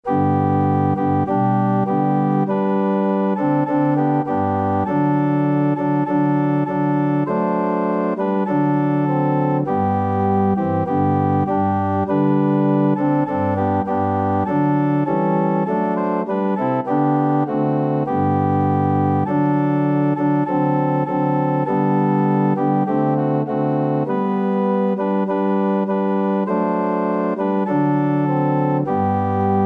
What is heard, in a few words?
jesus i my cross, organ